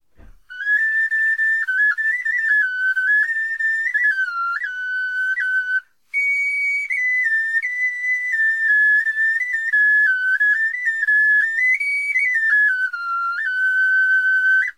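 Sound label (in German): melodie, Knochenflöten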